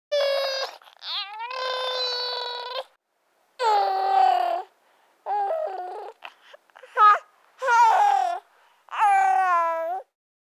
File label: baby gurgles; Asian Show Sound Effects